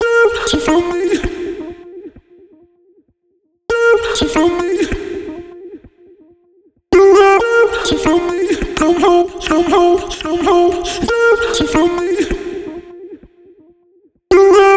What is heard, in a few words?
songstarter 130 Eb, Pop; Slate; SD PASADENA Pop Sample Pack